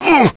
fall; quake2; ufops

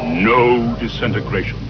pain; quake2; bobafett

